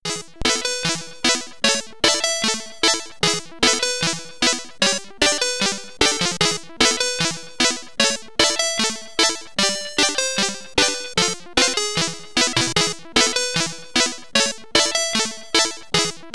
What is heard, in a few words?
High Score (SID Stereo), commodore 64; Guldkorn Ekspressen